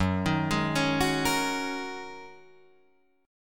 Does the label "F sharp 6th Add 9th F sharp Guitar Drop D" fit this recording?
Yes